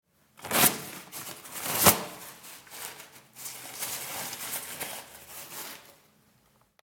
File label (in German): Papiertuecher